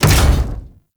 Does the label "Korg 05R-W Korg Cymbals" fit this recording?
No